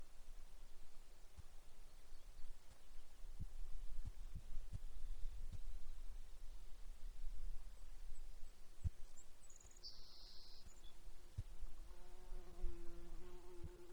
Repeated Insect Wingbeats With Identical Harmonics; INSECTS; Alaska